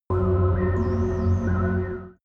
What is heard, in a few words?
extrinsic melody; energie